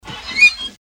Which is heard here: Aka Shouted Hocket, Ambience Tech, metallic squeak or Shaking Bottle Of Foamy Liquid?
metallic squeak